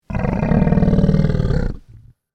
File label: Ankylosaurus Roar; dinosaurs